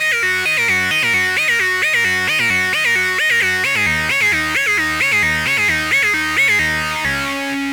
Lead, stereo delay; ZENOLOGY FX multi-effect plug-in

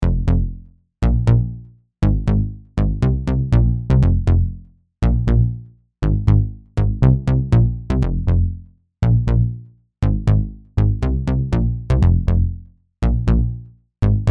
Bassline Combo

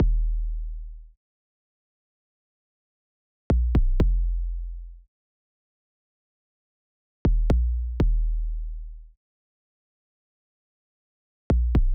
Sub Drum Track 808 Woozy Trap

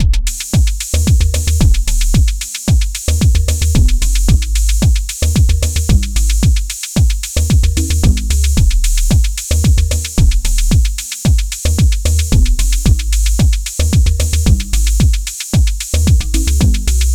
Logdrum Bass line with Drums 112 bpm

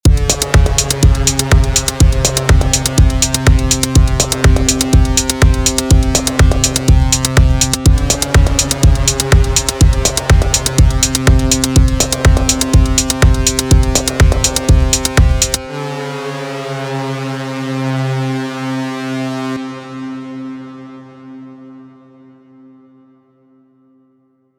System-8 Techno Siren

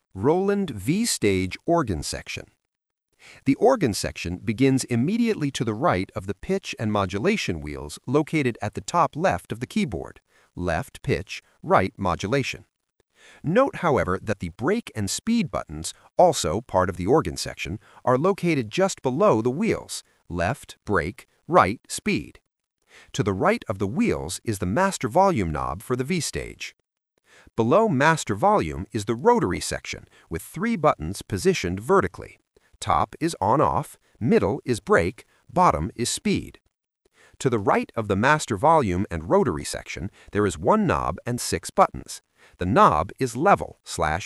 Organ Section

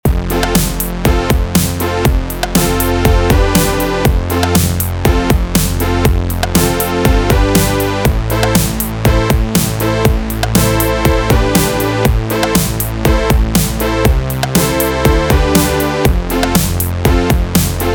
Jupiter Poly Brass Stab

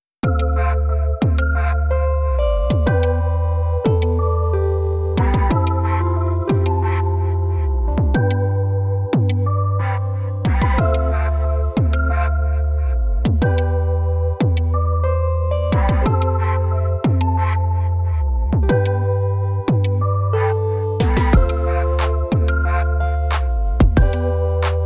Go Keys 5 Hip Hop Beat Instrumental

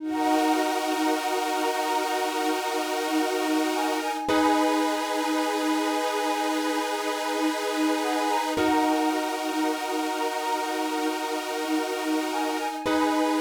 Amapiano Synths 112 bpm

